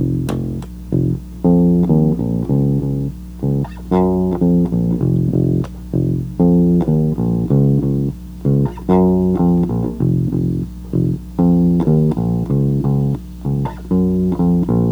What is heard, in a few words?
E Bass -> Boost -> Clean Amp -> SM57; Used as chops in a previous beat